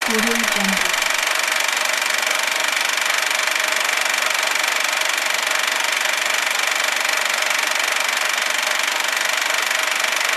movie projector

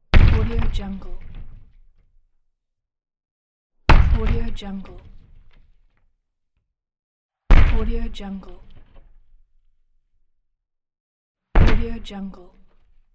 impact on the box

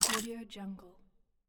puzzle game piece place sound

